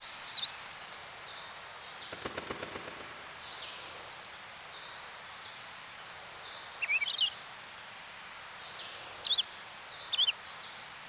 Birds Ambience; Chrono Cross

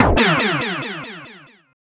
Game Over; Ganbare Goemon; Gradius; Legend of the Mystical Ninja

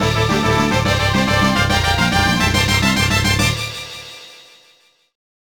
Fanfare; Chrono Trigger NDS

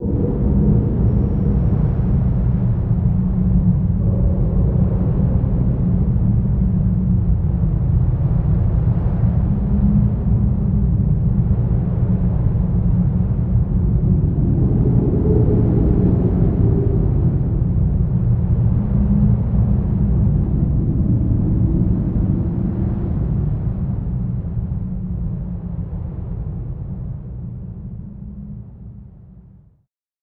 Cave Ambience; Chrono Cross

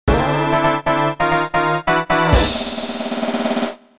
Starting Race

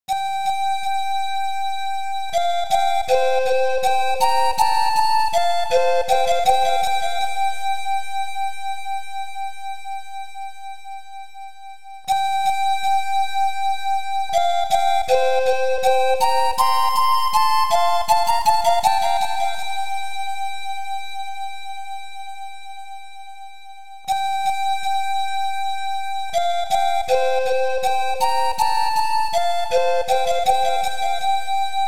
Flute Of; Lufia II: Rise of the Sinistrals Soundtrack

